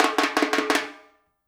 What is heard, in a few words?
FILL, Percussion